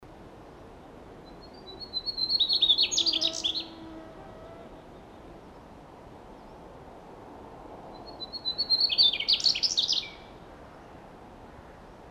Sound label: Mmugimakii, birdsong; Dacha